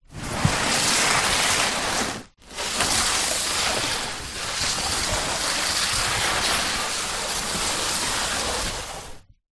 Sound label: cardboard box large slide garage floor long; foley